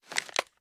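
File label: pistol reload empty end; gmod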